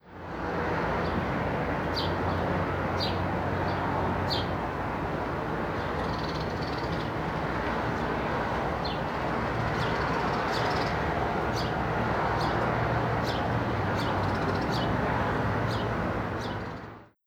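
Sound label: Dierkow Town ambience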